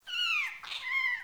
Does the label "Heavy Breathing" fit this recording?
No